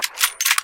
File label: reload